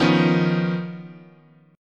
D sharp 7th Suspended 2nd Sharp 5th, chord

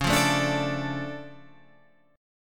D Minor Db, Dm/Db chord, guitar; Dm/Db strummed; JGuitar

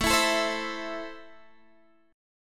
A Minor, Guitar, Standard; Am strummed; x,12,14,14,13,12

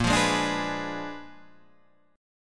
Bb 7th Flat 9th Bb, Bb7b9 chord; strummed; JGuitar

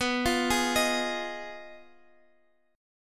F Minor 7th Flat 5th B

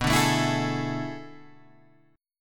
B Minor 13th B down Guitar Open G

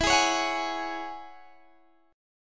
E 6th Add 9th E down Guitar DADGAD

